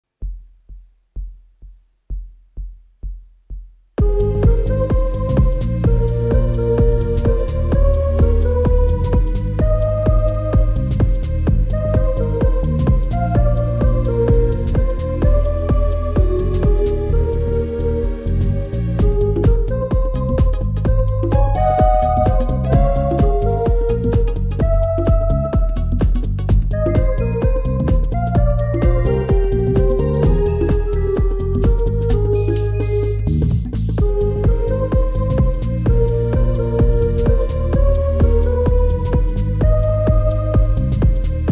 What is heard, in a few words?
hip dance